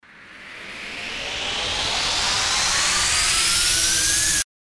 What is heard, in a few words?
RISER